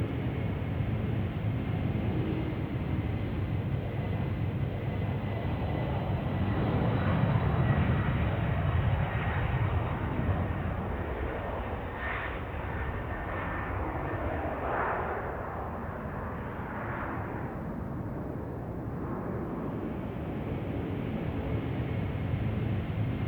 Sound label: jet loop; helicopter and planes